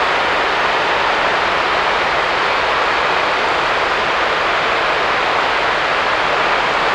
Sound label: Global Ambience Loop